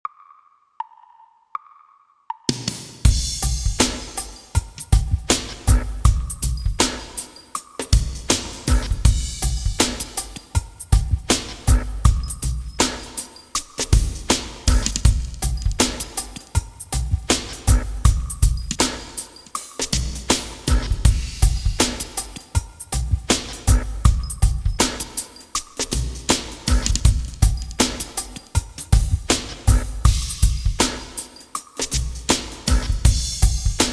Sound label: It is time Instrumental